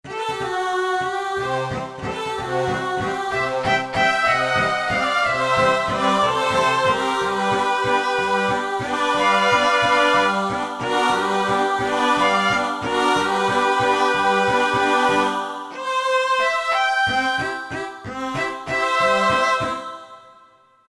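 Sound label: strings Ah; E-mu Proteus FX; Factory Presets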